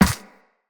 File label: Operator Run Ice, Footsteps; Characters